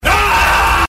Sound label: roar